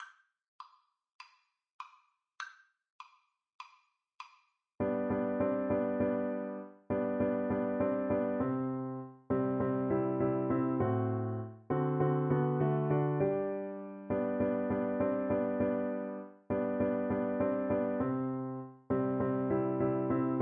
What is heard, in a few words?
violin; jingle bells